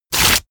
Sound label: sheet crumpled tear up fast